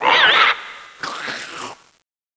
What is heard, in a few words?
zombi bomb idle; cs16; cstrike